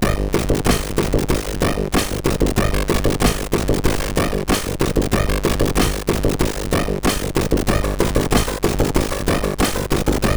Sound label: Techno Rap, sid CSG8580R5